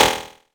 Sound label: Synth perc; musicradar; cartoon caper